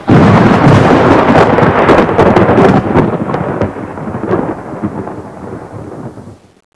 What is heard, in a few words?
thunder